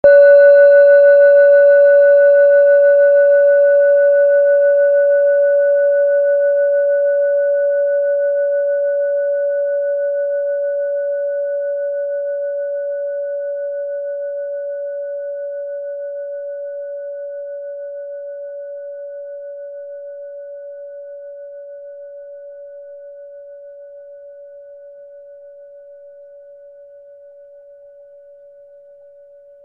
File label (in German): klangschale messing